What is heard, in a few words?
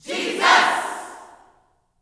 "Jesus", Vocal Gospel yell shout; Spectrasonics; Vocal Planet Vol.1 Gospel; akai